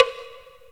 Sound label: C3 WODBLK; roland1